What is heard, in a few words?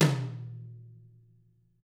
DW 10 hit; DW TOM; ILIO; Double Platinum Drums 1